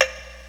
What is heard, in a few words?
Pop; gnustep